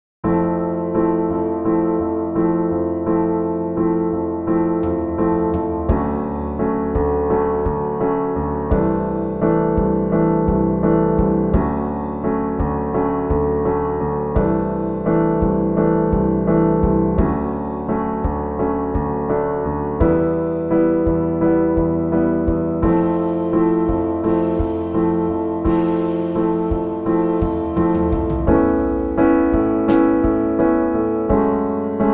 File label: piano thing rough drums